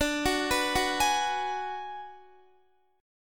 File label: D 6th Flat 5th, Guitar; x,17,18,16,18,16; 4F,4A,5C,5G,5C,6E; arpeggiated; JGuitar